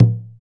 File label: PER Percussion